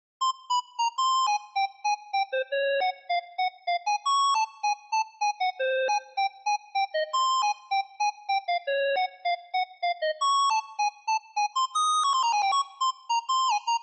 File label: Melody; chippy